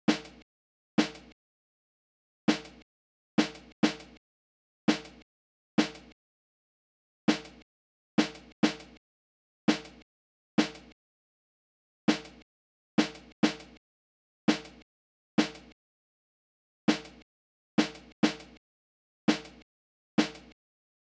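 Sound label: Snare 100BPM F Major Deep Real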